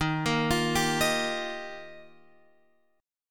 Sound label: D sharp Major Add 9th, Guitar Open F; arpeggiated; D#add9; x,10,10,12,10,10; JGuitar